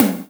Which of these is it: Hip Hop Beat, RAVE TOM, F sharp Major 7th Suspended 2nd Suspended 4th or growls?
RAVE TOM